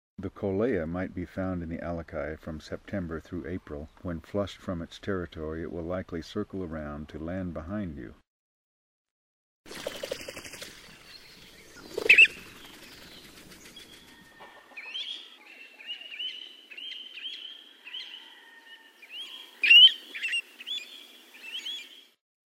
Kolea; Voices Kauai

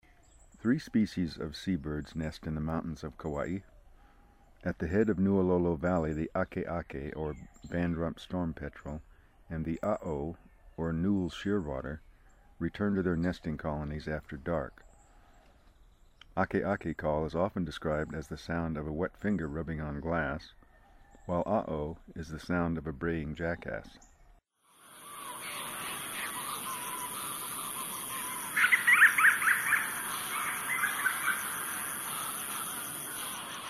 seabirds; Voices Kauai

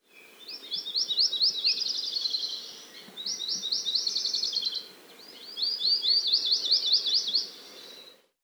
kauai akekee

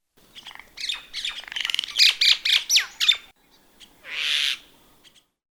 Myna

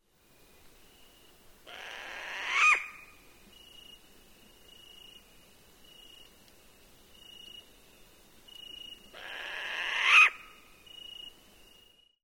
Pueo

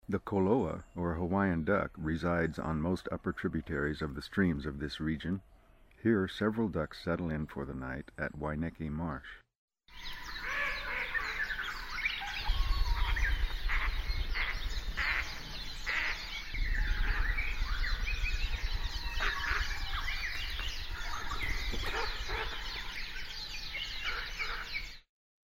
Koloa; Voices Kauai

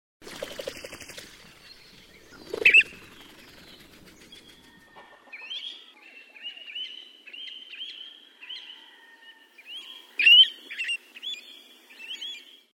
kauai kolea

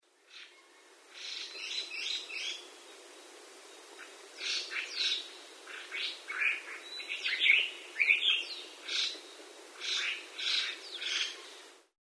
Hwamei

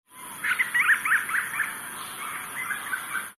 petrel

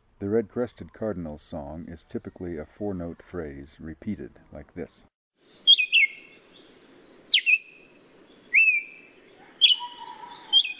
Red crested Cardinal; Voices Kauai

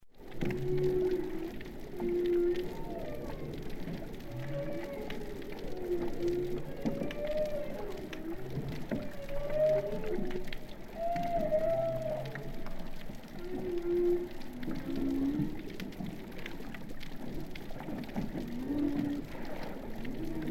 Humpback Lehua

